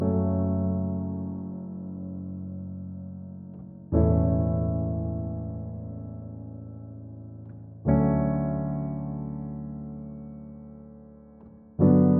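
122 BPM Piano Chord Progressions Cinematic Drama D major B minor Tonic F; MMB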